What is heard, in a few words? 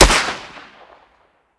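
weapon dmr sup fire player atmo ext; gmod; viper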